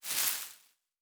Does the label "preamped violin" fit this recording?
No